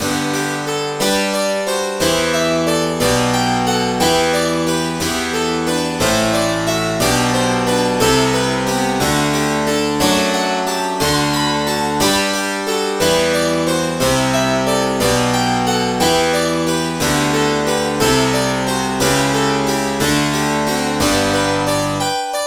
harpsichord; SpectMorph Evaluation #4

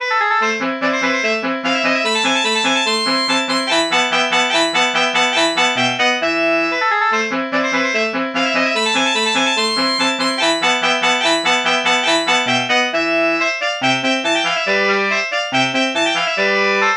tenor sax

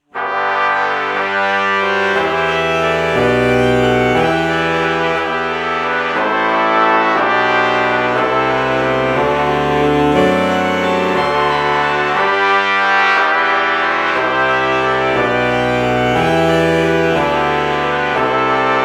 alto saxophone trumpet morph

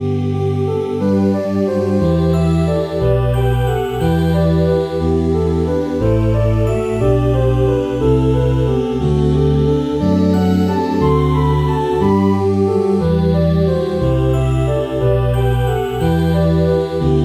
space voice

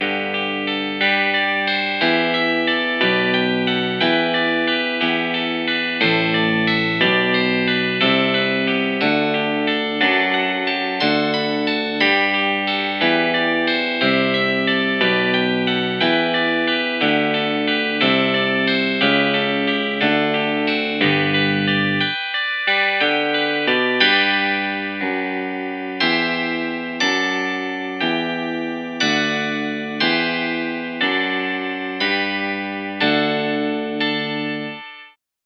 clean guitar

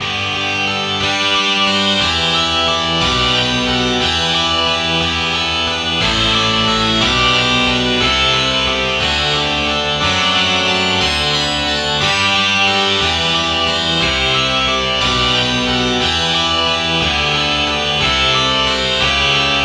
distortion guitar

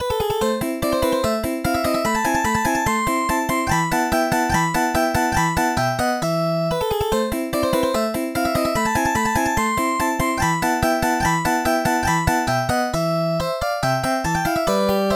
slap bass

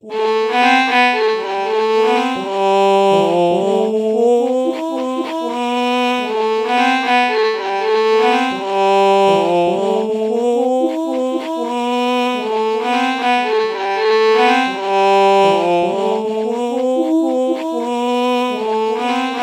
violin lpc true melody morph low

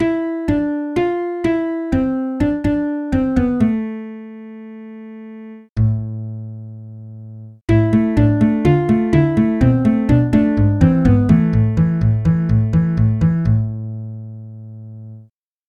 acoustic bass samples

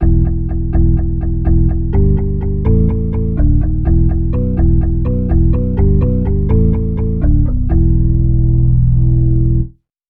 rock organ; bass

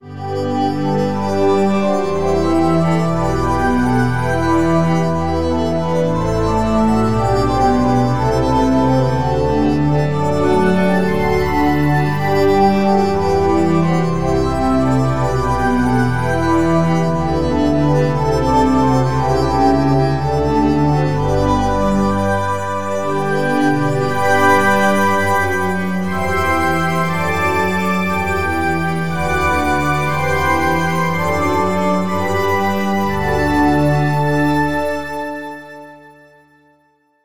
metal pad

